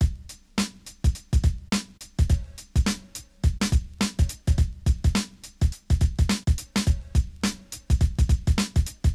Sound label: Composure Beat